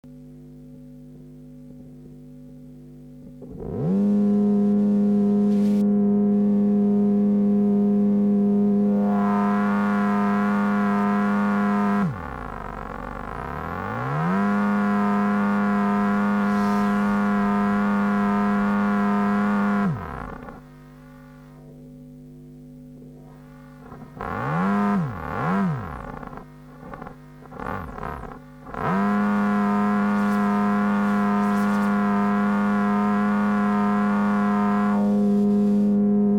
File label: motor II; 煩い; Wahペダル、アルミホイルで囲ぉぅが モーターノイズを思い切り取込んで